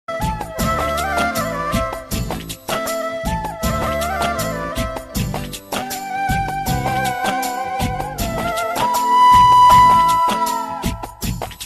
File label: Cool Music